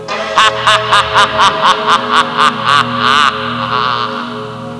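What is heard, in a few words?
cackling; Sound Library 2000; Wayzata Technology; Music In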